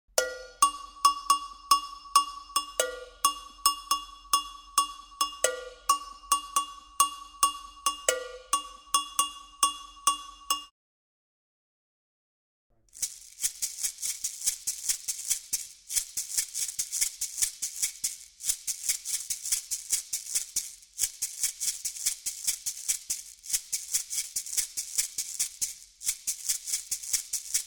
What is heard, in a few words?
atsia examples gankogui axatse kaganu kidi sogo; circle